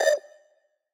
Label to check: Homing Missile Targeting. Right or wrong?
Right